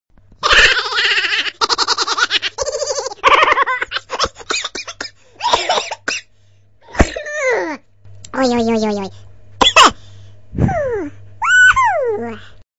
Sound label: Haha